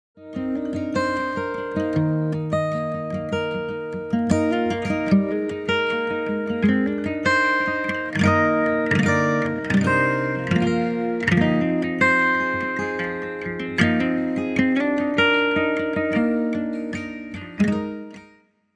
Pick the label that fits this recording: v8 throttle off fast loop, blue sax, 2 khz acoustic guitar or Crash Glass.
2 khz acoustic guitar